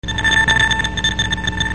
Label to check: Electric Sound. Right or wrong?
Right